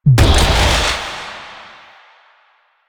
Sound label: Charged Shot, Sidearm; Weapons; Warframe; Tenno; Wraith Sidearm; Epitaph Prime